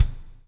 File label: BLUES TK, Backbeat; Spectrasonics - Backbeat